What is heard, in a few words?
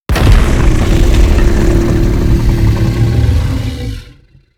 e skill b snake 1 to 2 evolution